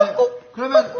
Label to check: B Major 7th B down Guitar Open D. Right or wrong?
Wrong